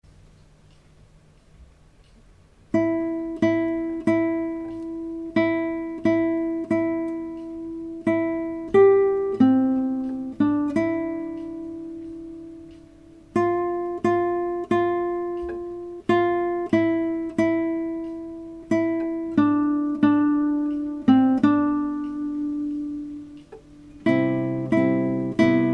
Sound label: Jingle Bells, guitar